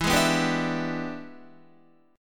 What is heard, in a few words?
E 7th Sharp 9th E down Guitar 4F 4A 5C 5G 5C 6E; E7#9 strummed